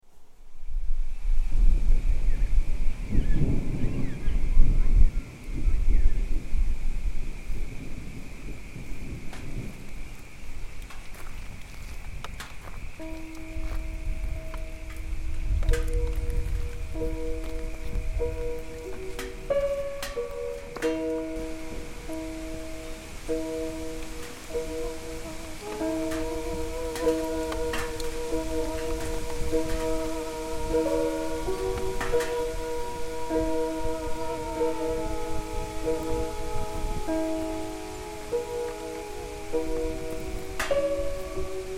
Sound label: ice rain spirit wind